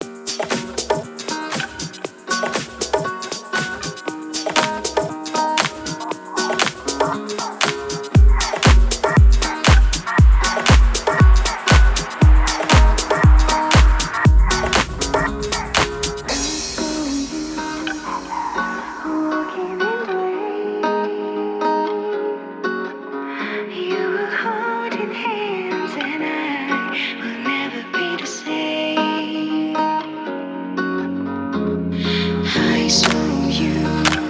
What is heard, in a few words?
The Rain Vocal Radio Mix, Chillout Music